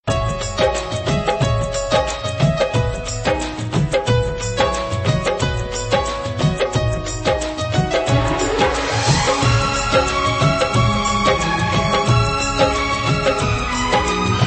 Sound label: sad sufi; ringtones